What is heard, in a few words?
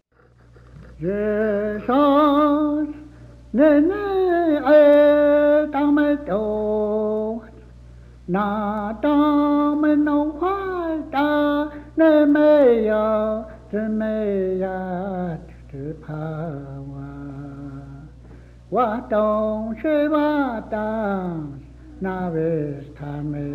hymnbook songs, cheyenne language; Jesus Nene'etame'tovatse